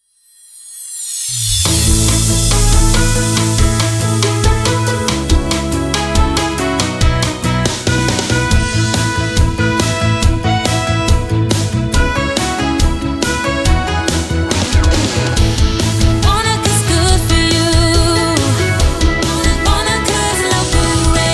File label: Jingle Ramp; Radio Wanaka